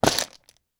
game scrabble game letter tiles in cloth pouch put down on board